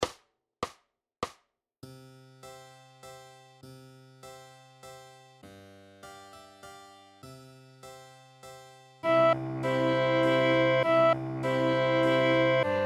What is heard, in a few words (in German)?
Menueett fuer geige